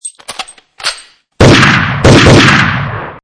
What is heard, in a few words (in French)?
Fusil M4; Son, coup de fusil